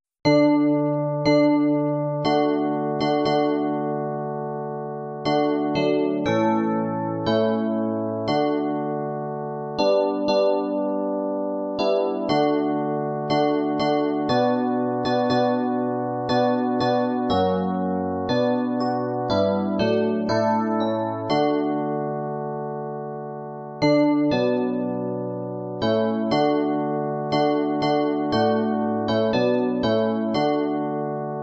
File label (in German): D Dur Electric Piano 2